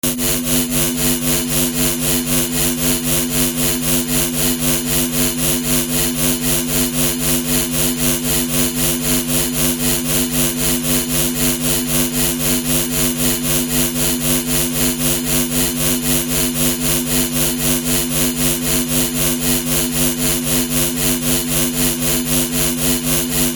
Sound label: science fiction power electricity source; sound effects one